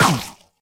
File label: chomp cake; HeavenStudioPlus; blue Bear